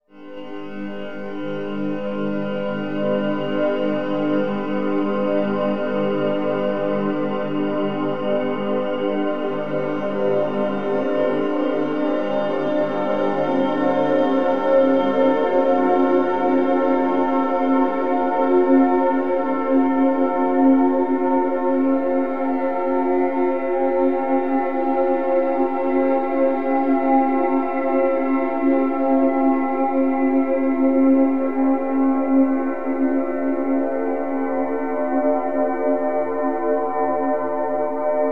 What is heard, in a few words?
MAJ. CHORD; Bolder Sounds - Granular; MAJ.CHORD RT